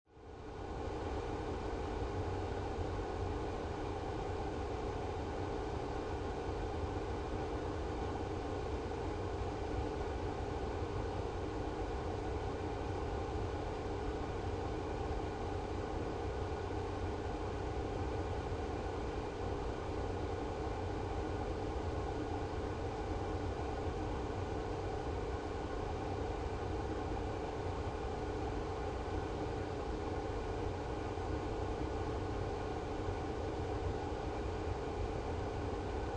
Transportation Automobiles, Car Interior, 1996 Acura Integra, AC Or Heater Running At Low Medium Speed, No Engine